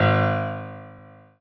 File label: piano